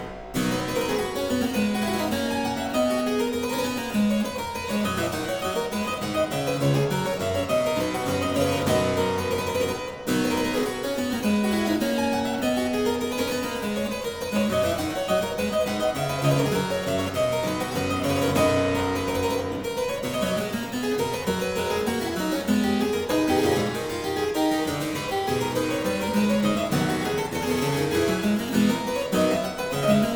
Aria Variations in C Major, Harpsichord; More Palantino

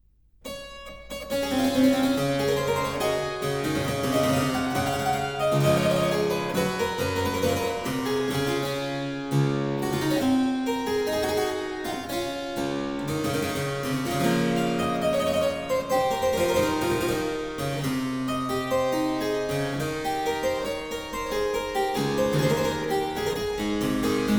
Suite in D Major I. Allemande, Harpsichord

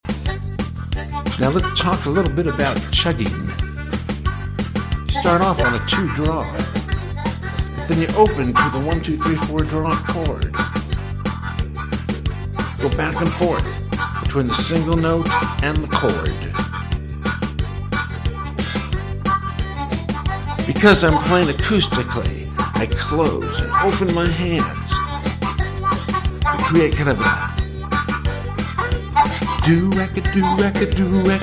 Chugging, harmonica

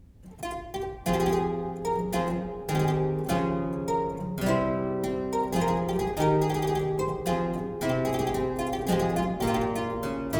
The Galliard (harpsichord, lute stop); Byrd

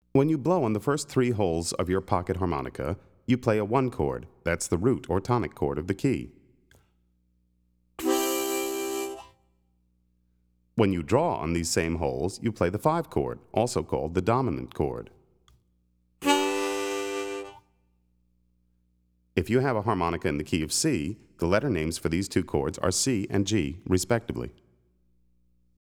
Chords, harmonica; Play Harmonica

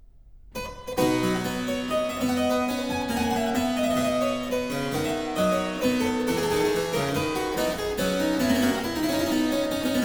Suite in C Major Courante, Harpsichord

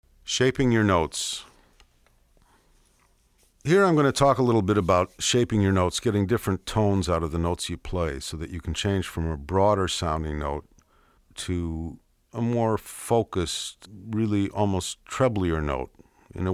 Shaping Notes, harmonica; Blues Harmonica Masterclass

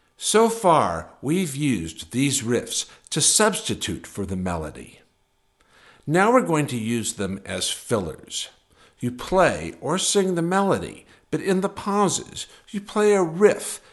Fills, harmonica

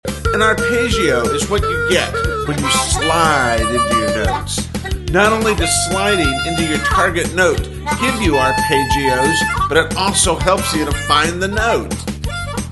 Slides, harmonica

